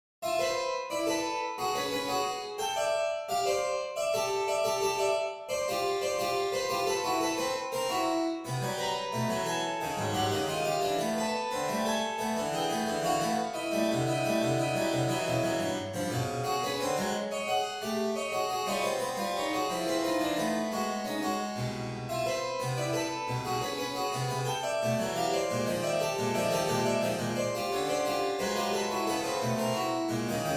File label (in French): fugue en la pour clavecin